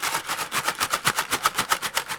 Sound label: Foley